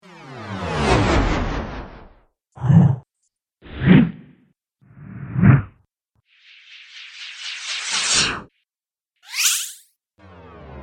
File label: Whooshes A Variety Pack